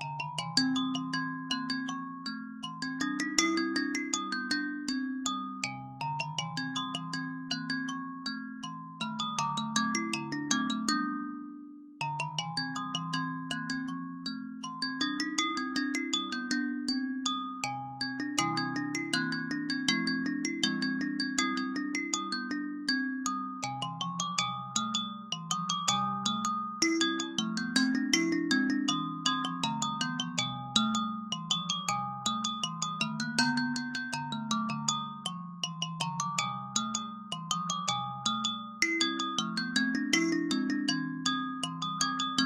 Music Ice Cream Car; Last Day on Earth Survival; android

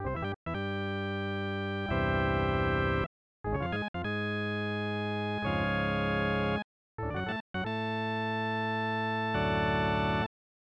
Charge!; MLBPA Baseball

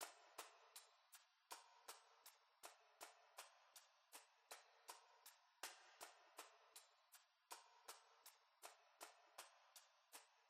Background Loop

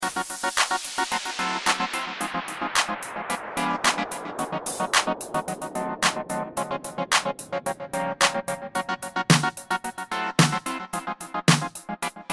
BGM Menu; Oshaberi Horijyo

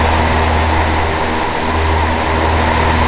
Fan Medium LOOP; Puddle; puddle plus ios gamerip 2018

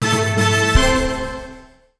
disco combo; Elephant Disco

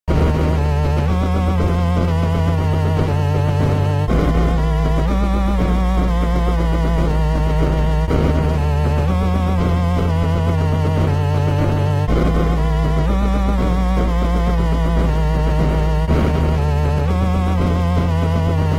Chiptune Anxiety; timothy and the mysterious forest ps4 switch windows gamerip 2019

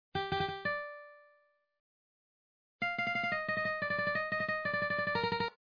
Melody

